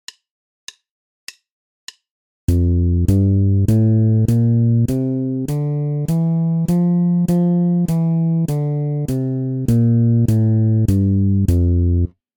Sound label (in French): Gamme majeure de Fa, basse; exercices